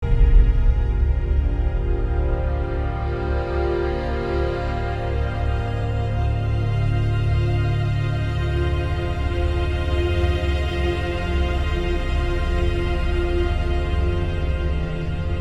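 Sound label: Music Neutral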